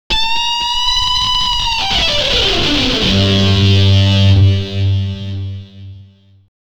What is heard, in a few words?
Game Over; The Eye of Typhoon; MS-DOS, Windows